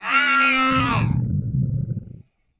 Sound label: Effected Snarls, Vocals Humanoid; Sony Pictures Sound Effects Series Volumes 1-10; Sony Creative Software